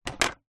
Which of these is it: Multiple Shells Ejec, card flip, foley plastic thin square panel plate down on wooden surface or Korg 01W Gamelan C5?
foley plastic thin square panel plate down on wooden surface